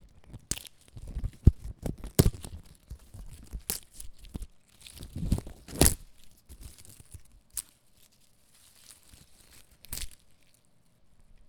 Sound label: Ripping Leaves; Walker Lab